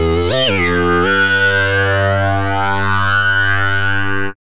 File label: Tau Stinger; Muon Tau Pro